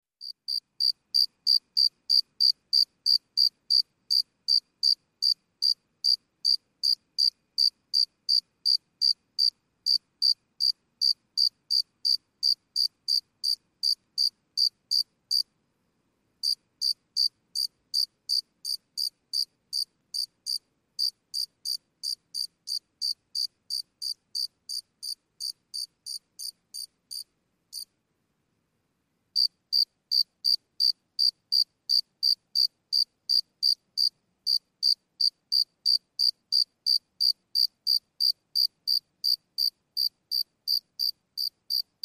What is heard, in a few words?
Single Cricket